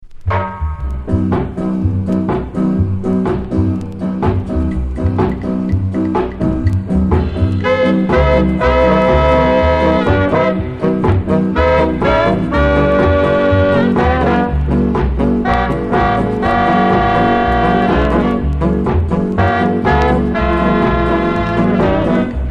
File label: ska C